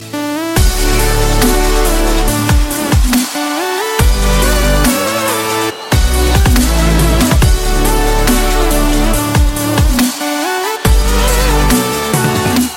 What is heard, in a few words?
Dubstep; Lotr